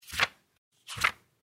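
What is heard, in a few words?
quickly turned page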